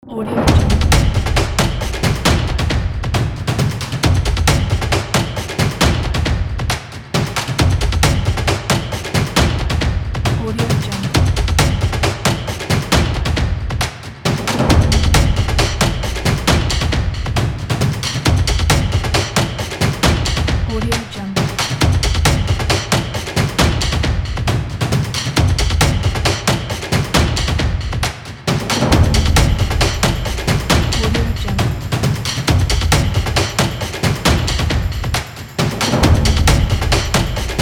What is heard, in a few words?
the cinematic percussion